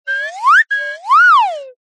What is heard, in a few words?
cartoon slide whistle wolf whistle